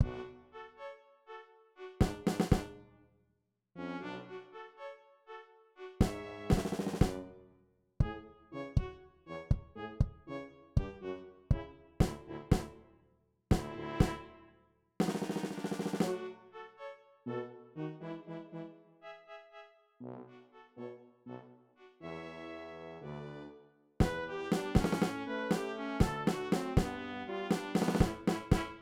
perc

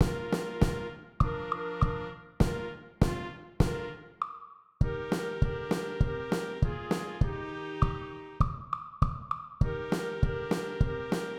perc

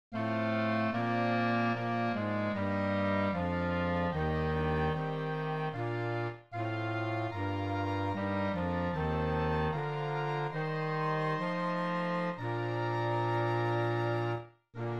tuba Bb; gudsignevaartdyrefedreland

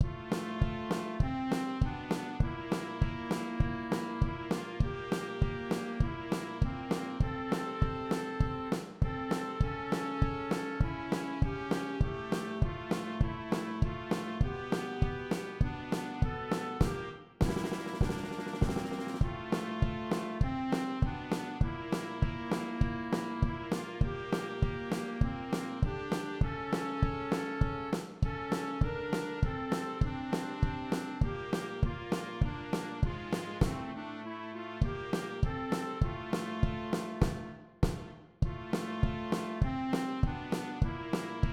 perc

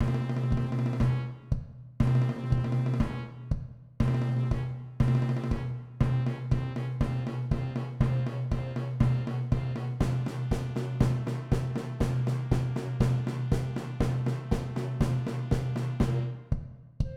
perc; Borgertog2019

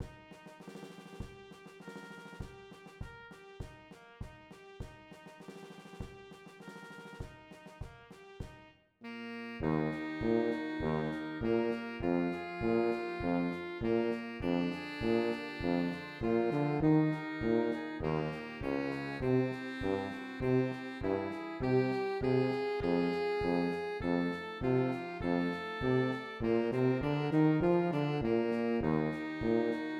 altsax

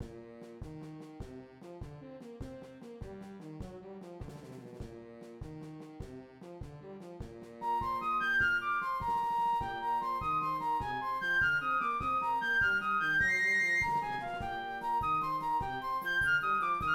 flute; Borgertog

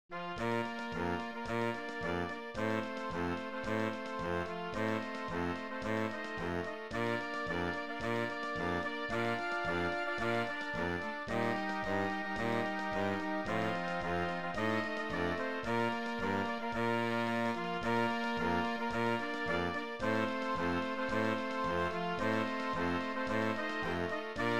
Eb Tuba

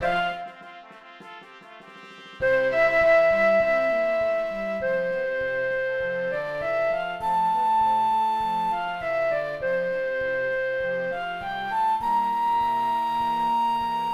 flute

